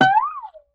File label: Guitar Plink Slide